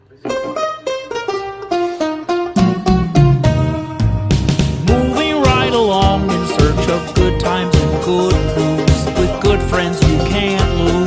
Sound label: English Ringtone